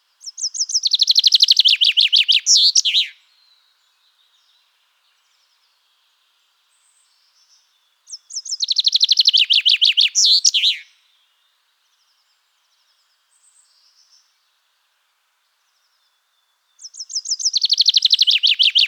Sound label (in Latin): Fringilla coelebs; SETE